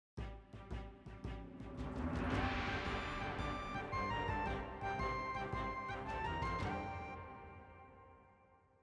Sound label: success oneshot; merchantnavy; everdawn; quests